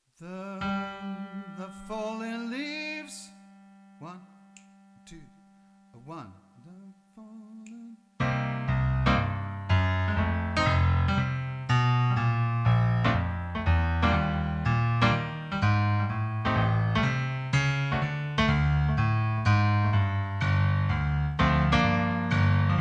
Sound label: inst jazz; Autumn - Inst. jazz M